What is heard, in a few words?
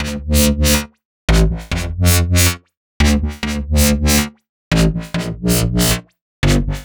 140 D BASSLINE, Dubstep; Loopmasters; The New Sound Of Dubstep; BASS LOOPS